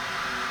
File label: SAX AIR; Ensoniq ZR-76